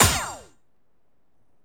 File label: SE GAME CRITICAL PERFECT; maimai